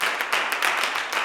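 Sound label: CLAPS 96, Gospel; Blues & Soul; G.CLAPS 96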